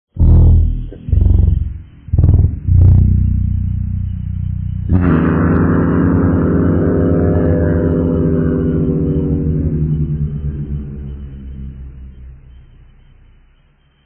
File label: horn; vapesquared